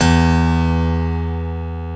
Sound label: PIANO, E; Best Service ProSamples vol.10; House; AKAI; SY PIANO